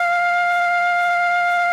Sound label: flute A, FLUTES; Best Service ProSamples vol.55 - Retro Sampler; AKAI